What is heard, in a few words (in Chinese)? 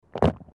男咽口水声